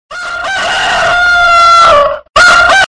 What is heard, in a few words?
crowing rooster gets shot